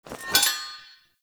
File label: Armour Fragment Pickup; Objects; Pickups